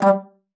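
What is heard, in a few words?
G3, bass flute; Xsample VOL-2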